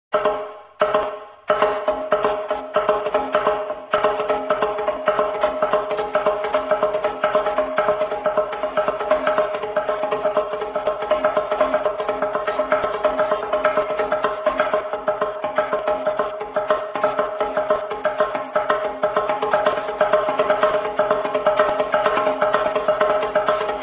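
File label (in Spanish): txalaparta; tradiberia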